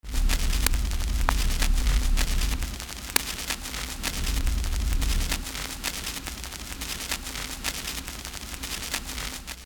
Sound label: Record Noise; Construction Kit; HIP-HOP; Sonic Foundry (Sony Creative Software)